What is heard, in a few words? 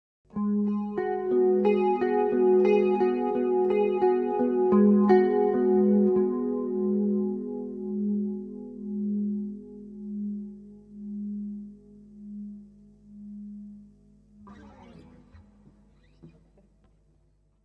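Electric Guitar Melancholy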